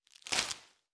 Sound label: gather fruit